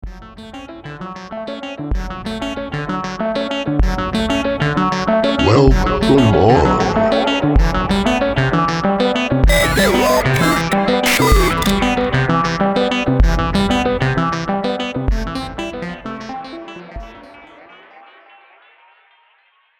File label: jingle; en